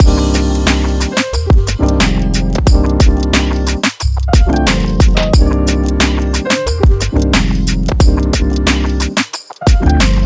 groovy hip hop loop

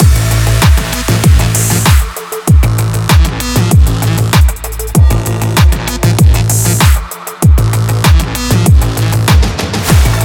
adrenaline sport

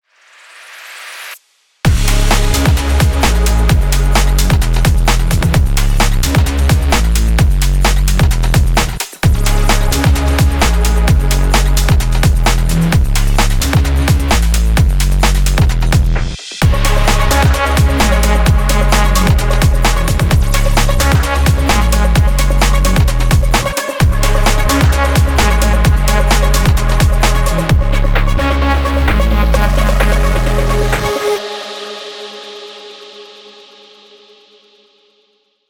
sport rush

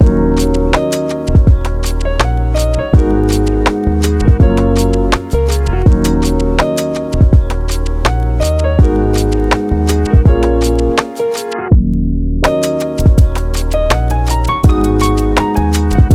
background lofi for lifestyle loop

